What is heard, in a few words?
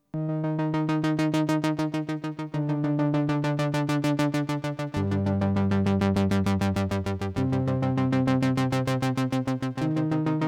Korg Minilogue VCF mod